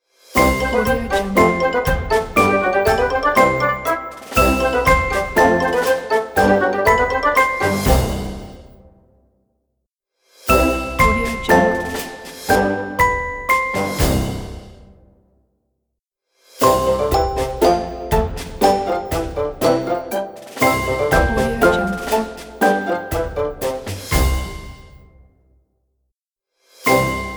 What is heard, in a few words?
animation symphonic logo